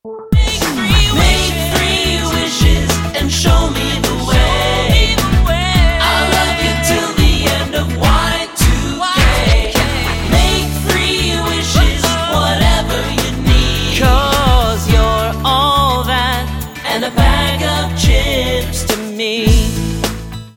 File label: Ringtone